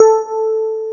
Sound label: RV E. PIA